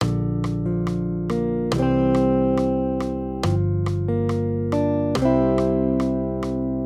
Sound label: maj triads